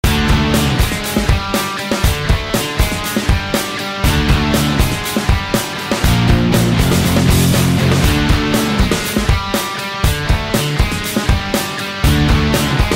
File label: race; D1 Professional Drift Grand Prix